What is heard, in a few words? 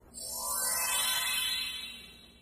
Anime Magic Sparkle